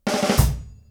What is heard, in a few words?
KICK SNARE, Live Snares